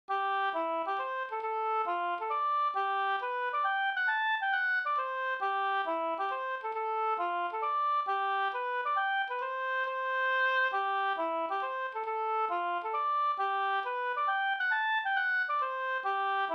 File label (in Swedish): hambopolska